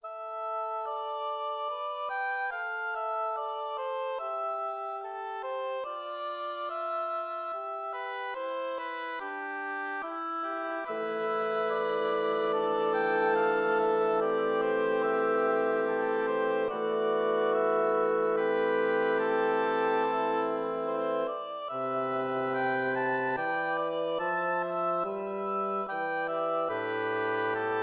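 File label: double reed choir; ravel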